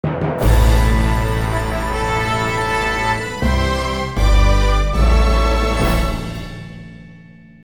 Award Fanfare; Farm Frenzy 2 - Pizza Party

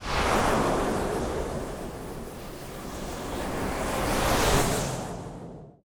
dark spell absorb

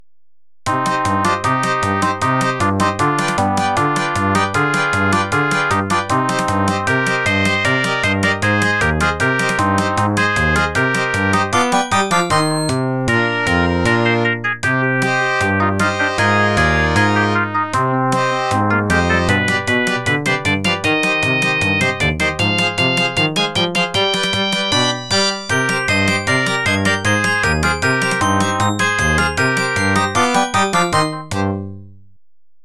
Celebration; Tetris; X68000

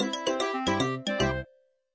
Music New season; papas cupcakeria online windows gamerip 2013

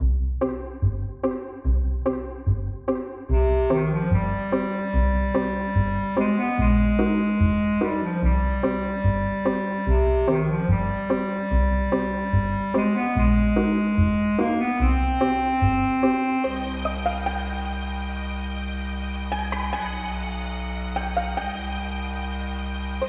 Press Start; The Henry Stickmin Collection

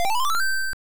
Item Jingle; the legend of zelda nes

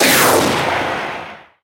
Weapons Shock Rifle; unreal development kit

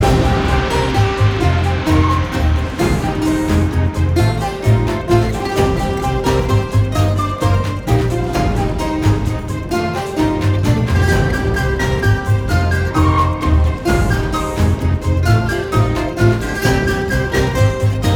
Water area Combat

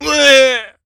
stomped exclaim

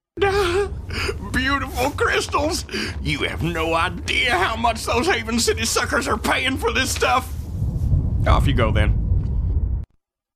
Miner success (cinematic)

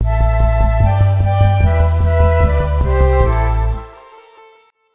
Defeat; Zendoku; PSP

